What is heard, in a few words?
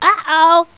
hoho